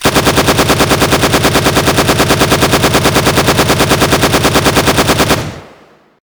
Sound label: bullet chaingun; ufoai; weapons